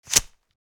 Removing dart from holster

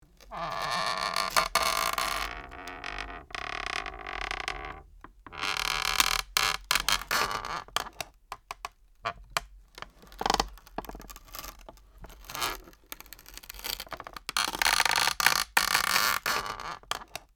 Wooden chair small creaks of movement

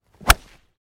Golf driver hits golf ball; wilson staff

